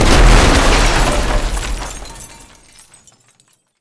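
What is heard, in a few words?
hornet rocket explosion; Titanfall 2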